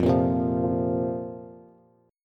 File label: F Suspended 4th F, Bass; Fsus4 strummed; JGuitar